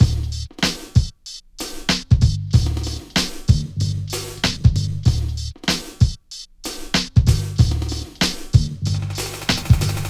Beat 095, Hip Hop

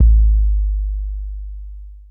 Kick B Hit; Hip Hop Exotica; Apple Loops; 086 Dmin

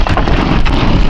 Debris; Cymatics; Foley Wavetables Vol 1; Serum

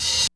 Rev Cymbal

